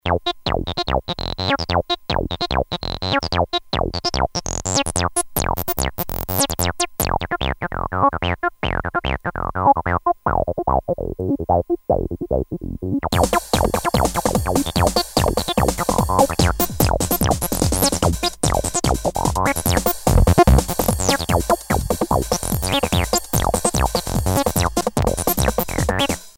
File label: saw